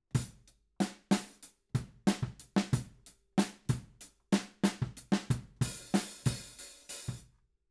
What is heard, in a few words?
Ride